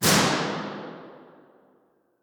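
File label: stairwell impulse response; spring lane building university york; b-format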